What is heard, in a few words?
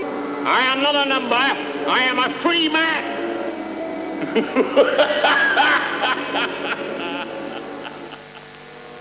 Man Laugh